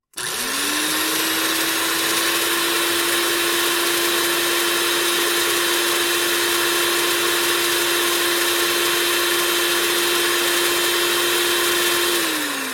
Blender Long

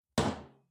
Metal Footfall; soundscrate

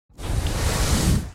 Flamethrower; SoundsCrate

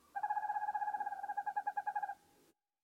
owl; soundscrate

